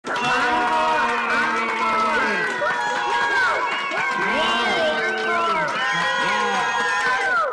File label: crew cheers applause